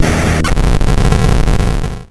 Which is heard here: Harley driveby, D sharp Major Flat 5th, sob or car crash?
car crash